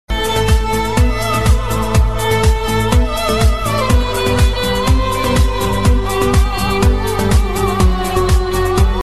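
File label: violin ringtone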